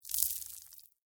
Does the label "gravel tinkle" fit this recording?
Yes